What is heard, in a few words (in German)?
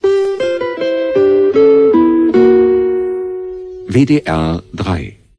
nachrichten, jingles